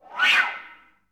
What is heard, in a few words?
FL FX, Advanced Orchestra